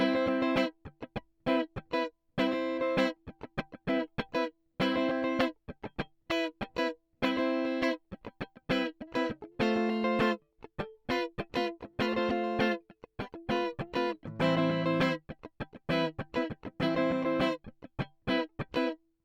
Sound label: Guitar Rythm Dry